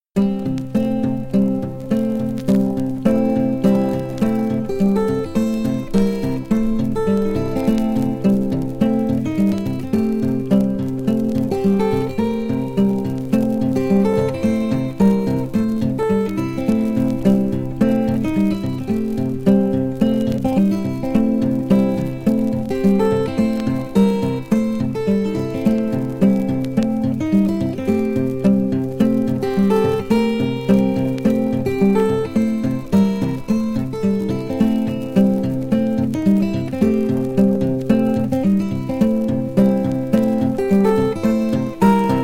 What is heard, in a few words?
East Colorado Blues, finger picking guitar